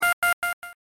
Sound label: beep